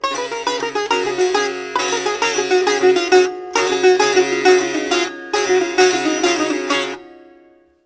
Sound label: SITAR GRV, World Colours; Best Service